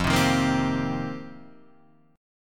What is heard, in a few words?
F 7th Suspended 2nd Suspended 4th, Guitar Half Step Down; F7sus2sus4